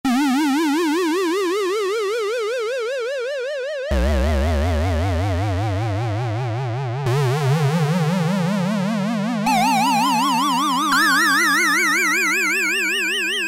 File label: Crazy Pitch LFO, Roland JX-3P